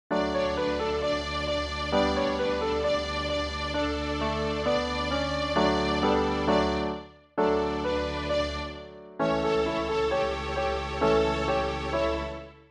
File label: A String High D C B A